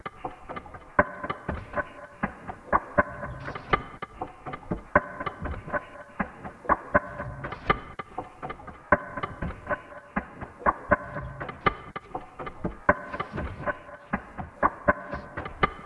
Percussion Loop 121 BPM; Shady; Hip Hop

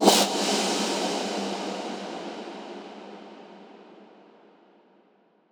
Atonal Depths, Psytrance

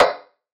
Vintage Percussion; Creek; Cymatics; Mystery Pack Vol 4

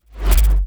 Break Mono Jolt

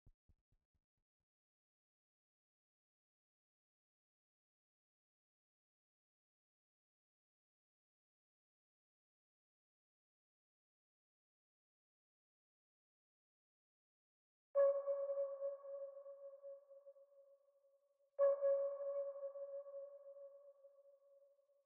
132 BPM C# Min Drone; Trap; Loop Stems; Glock

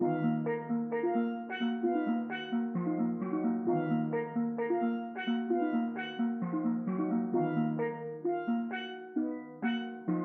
131 BPM D# Min Steel Pan; Melody; Loop Stems; Cymatics; 2022 Melody Collection Platinum Expansion; Murderer

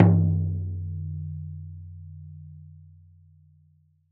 Buffalo Drum, World Percussion; Cymatics; Emperor World Percussion

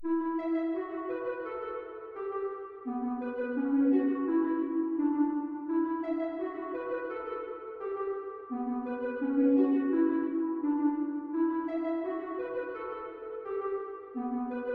85 BPM B Min Pluck; Loop Stems; Cymatics; Potion

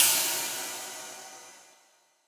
Ride; Hats and Cymbals

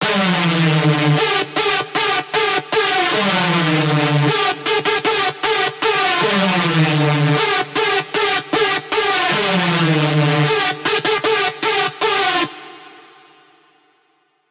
screech loop D min 155; Music Loops; GhostHack

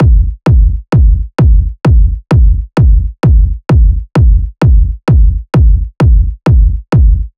Kick Loop 130, Techno; Ghosthack; Free Techno Sounds 2024

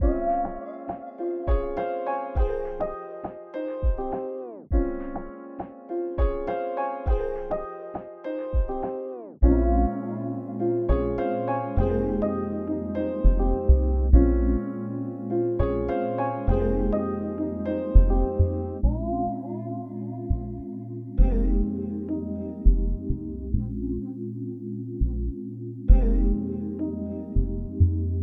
102 BPM F Min, melody loops; Cymatics; Orchid Sample Pack

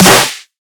Snare, Dubstep Drum Shots

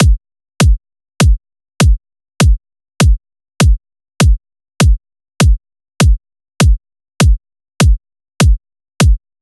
Drum Loop - 100 BPM Kick, Midtempo; Deadlock; Cymatics; ANALOG EVOLUTION Beta

